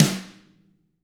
tama rim; ILIO; Double Platinum Drums 1

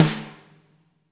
WRM SNR, WARM+FAT KIT; Spectrasonics - Burning Grooves CD2

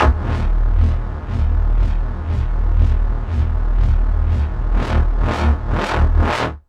attack bass one-shot; KJ Sawka - Drum n Bass & Beyond

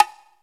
HI BONGO WET; Kid Nepro; Elite

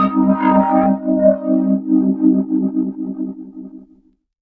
SE ORGANIC, Overdrivin'; E-MU Producer Series Vol. 6 – Analogia Project; Strictly Synthe; SE-1

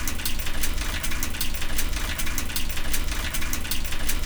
bicycle; AKAI S-Series CD-ROM Sound Library VOL-6

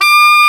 SAX A. FF D, Alto Short; Roland LCDP07 Super Sax

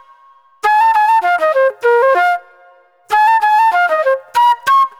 NY 70's Jazz Flute; Sonic Foundry (Sony Creative Software); Manhattan Flutes; Session 01 - Benedetta

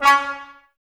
brass, Tbs Mute

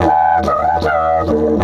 E 150, DIDGE RIFFS

